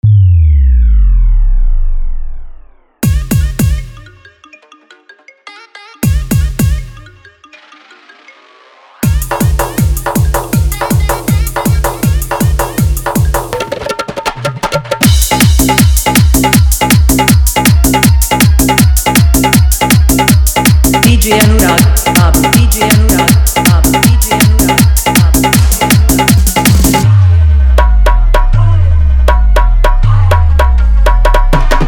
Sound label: Dhollki Bass Mix